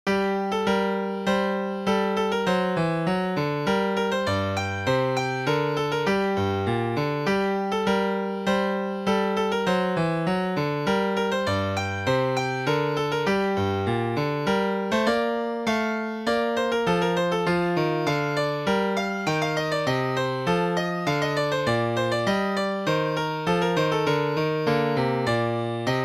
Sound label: Polonaise in G minor, piano